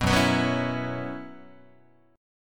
Gb 7th Suspended 4th Gb down Guitar, chord

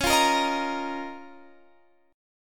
F 7th Suspended 4th Sharp 5th C sharp

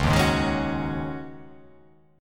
C sharp Suspended 2nd Suspended 4th, C sharp

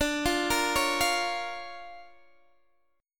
D Minor Major 7th Sharp 5th, D, Guitar, DADGAD

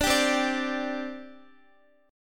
C Suspended 2nd, C, down, Guitar; Csus2; strummed

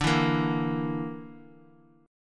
D Suspended 2nd Sharp 5th D

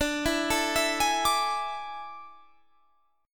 A Major 7th Suspended 4th D

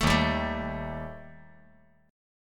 Eb Major Flat 5th Eb; EbMb5 strummed; JGuitar

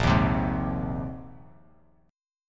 Bb 9th Suspended 4th Bb down Bass Standard; strummed; JGuitar

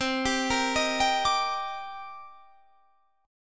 Eb Major 7th C; Ebmaj7/C; arpeggiated

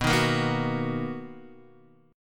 B Major 7th B down Guitar Drop D

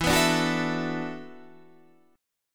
E 9th Suspended 4th E down Guitar Open D

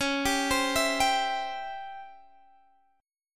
Db Minor Major 7th Flat 5th Guitar Open D; x,16,17,18,19,17; arpeggiated

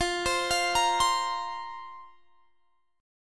F Suspended 4th F; Fsus4; arpeggiated; x,20,22,22,23,20

